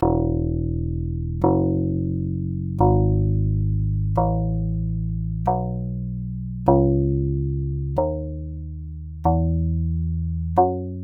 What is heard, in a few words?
bass